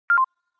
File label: Call Disconnect; Samsung Galaxy SL SCH-i809